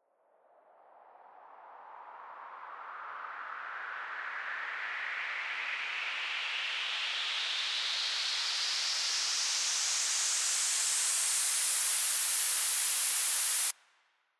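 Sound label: FutureBass Mix FX Riser Noise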